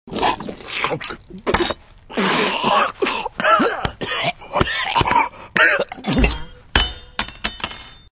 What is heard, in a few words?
shoot